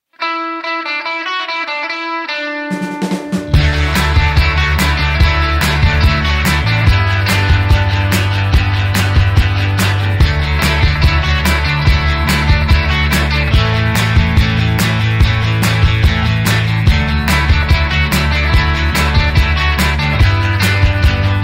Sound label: Rock Party